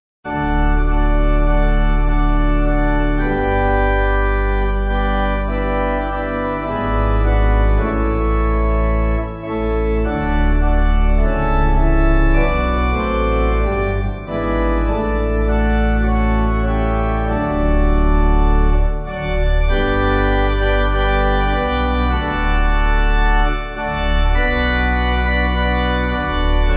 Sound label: Pipe